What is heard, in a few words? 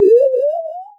Alien Space Sound